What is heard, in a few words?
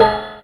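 Industrial rave; Yamaha DTXpress; Effect 1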